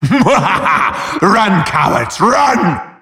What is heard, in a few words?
wheel scared